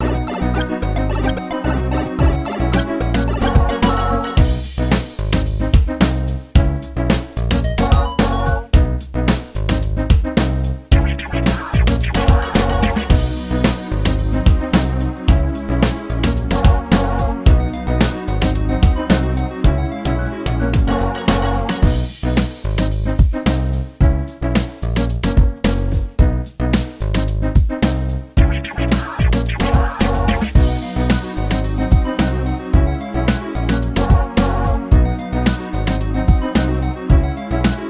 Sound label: Instrumental; Bricks; Friday Night Funkin' Platformer Panic OST PC mod gamerip 2022